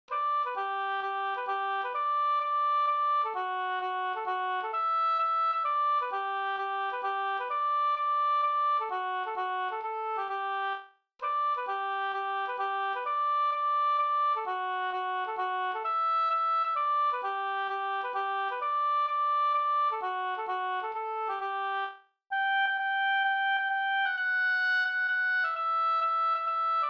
hambopolska; Bjurstorparns polska Lerbo Sd

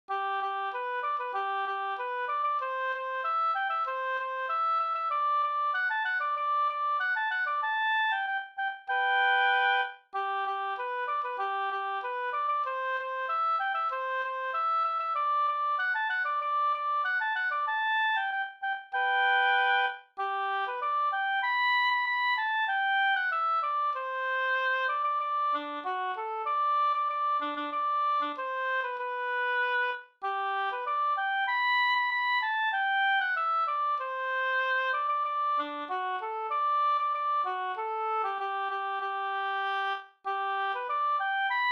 ganglat; fr Karlslunda Sm

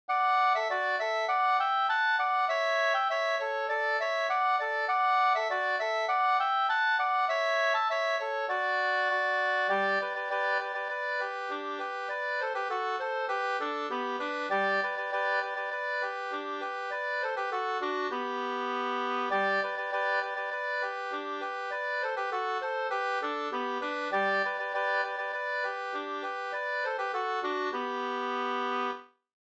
ganglat

